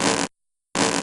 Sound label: tug; uzukumaru